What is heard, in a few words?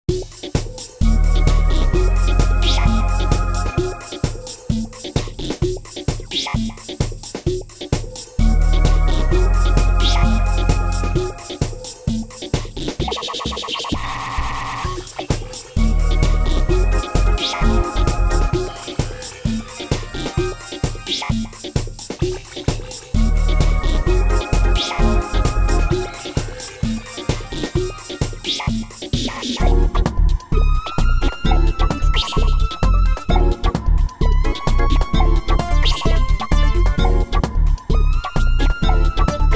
house home; XV5080